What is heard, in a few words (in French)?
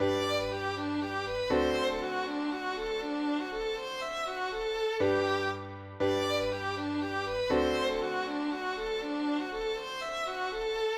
mazurka polonaise